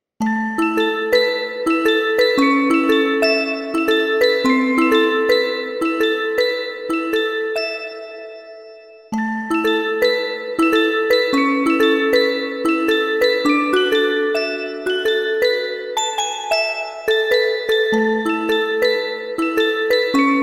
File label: Home (Music Box); Undertale; SILVAGUNNER RIPS